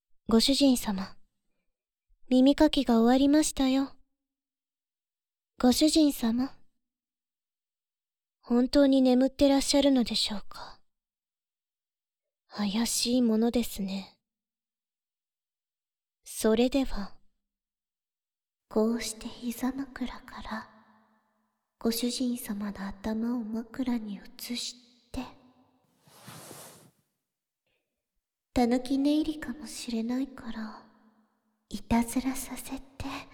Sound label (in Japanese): たっぷりお耳舐め, ASMR